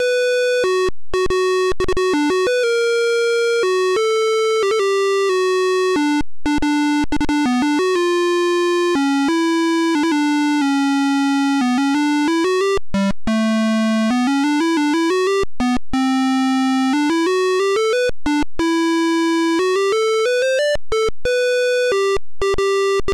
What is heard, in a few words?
Victory; Space 1889; DOS